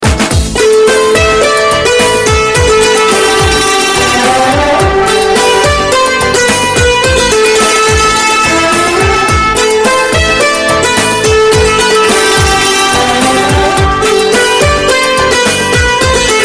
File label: INSTRUMENTAL RINGTONE; RAAZ MOVIE